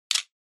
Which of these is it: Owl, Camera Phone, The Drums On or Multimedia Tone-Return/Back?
Camera Phone